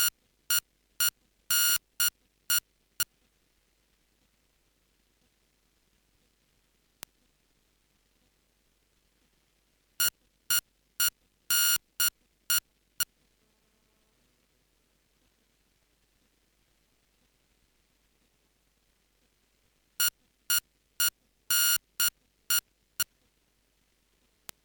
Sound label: timer; GPS-mayak